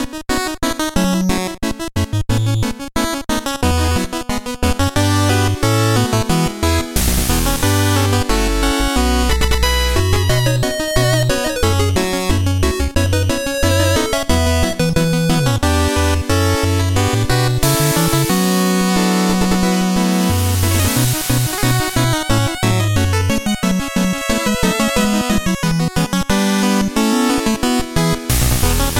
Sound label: Village; Shovel Knight